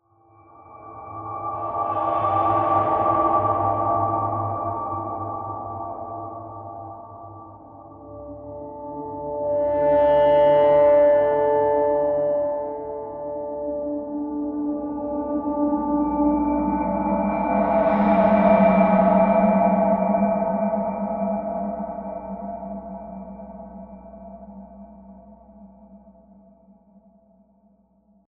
Textures; Absence of Life

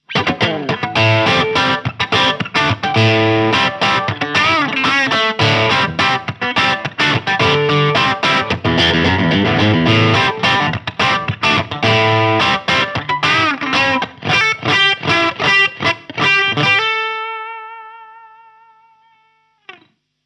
Orange Rockerverb 50H MKIII Strat Crunch

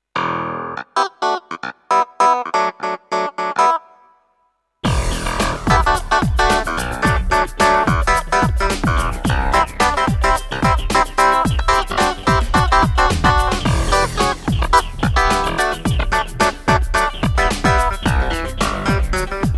Crazy Clav; Combi

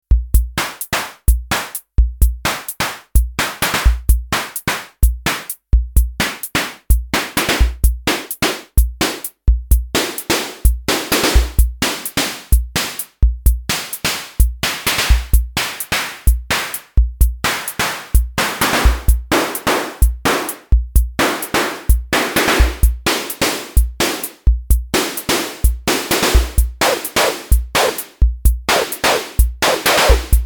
Snare

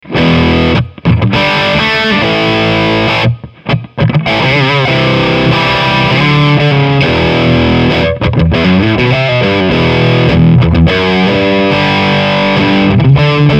Rock Poweramp

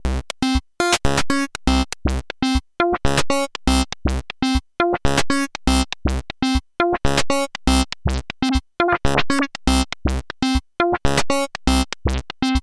Buzzy Filter; Freds Lab

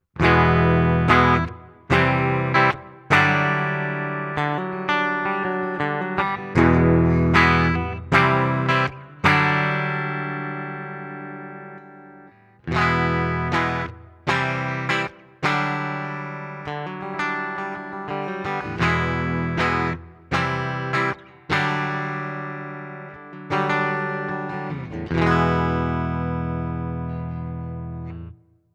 X700 Both Pickups Clean Voice 2 Split; Cort

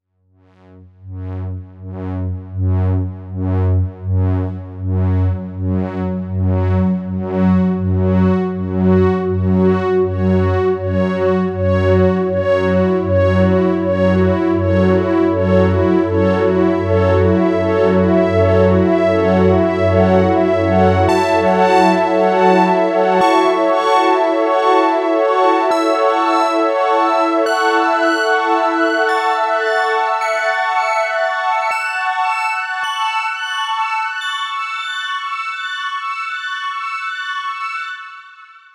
OB E Modulation Pad

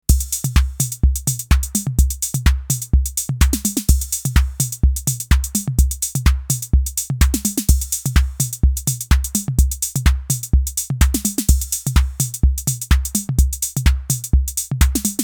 TT 606 Shuffle Modes

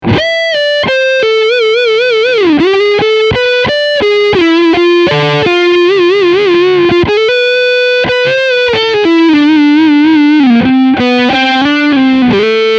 Leadline Front PU

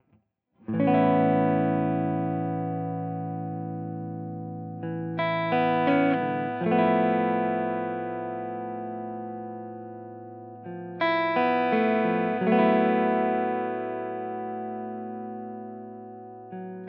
LoFi Hiss

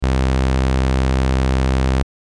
BX SAW; Brainworx

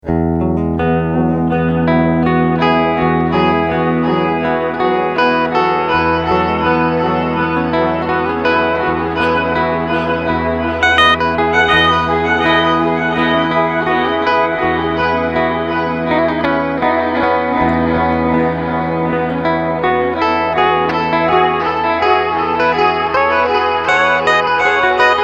Echo

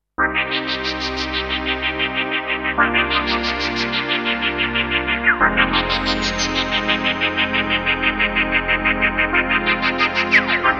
LP Filter LFO ENV Cut Reso

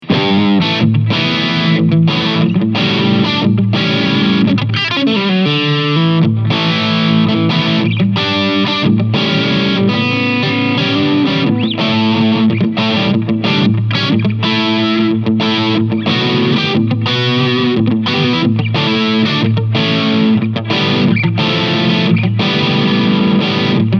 Blackstar Silverline Special Crunch 6l6 Reverb

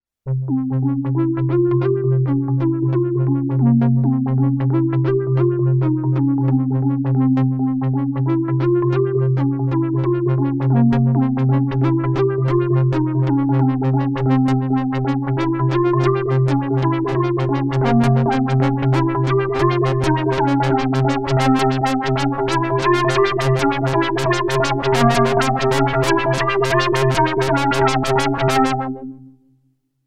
microfreak V4 Presets

